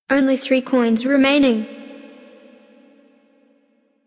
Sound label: coins; unicorn